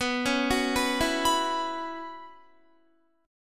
B Suspended 2nd Suspended 4th, Guitar; Bsus2sus4; arpeggiated

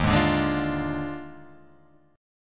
Eb Major 7th Suspended 2nd Suspended 4th Eb down; strummed; chord; JGuitar

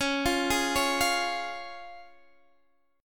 C sharp Major, Guitar Half Step Down

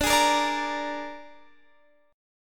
Ab Suspended 2nd Flat 5th D, chord

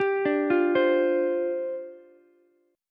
C Suspended 2nd Suspended 4th, down, Ukulele; Csus2sus4 arpeggiated; JGuitar

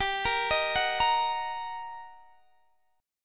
G Minor Major 7th Sharp 5th, down, Guitar, DADGAD

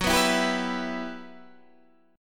E Minor F sharp, chord

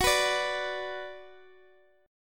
F sharp Suspended 4th Sharp 5th, Guitar, Open D; down

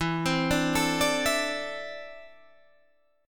D 7th Suspended 2nd, chord

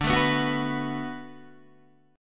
D 6th D down Guitar Open D, chord

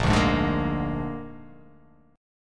A sharp 7th A sharp

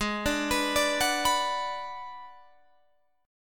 Ab Minor 7th Flat 5th Ab, down, Guitar, Open G

